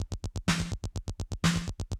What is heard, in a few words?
125, Classic Drum Loops